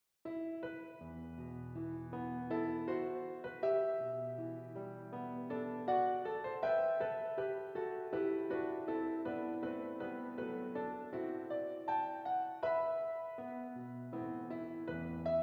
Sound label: satb alto 2 predominant